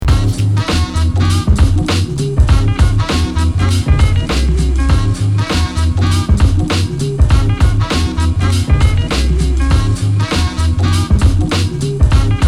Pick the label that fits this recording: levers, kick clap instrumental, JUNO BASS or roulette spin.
kick clap instrumental